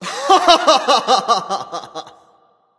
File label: Ram laugh; smite